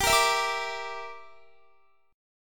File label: G Minor 6th, Guitar; Gm6 strummed; x,22,20,21,22,21